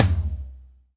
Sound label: Percussions